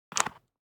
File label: DICE on Cardboard, Grab, Standard, 3 Three Dice; Samples; ArticulatedSounds; DICE Sfx Party (Extended)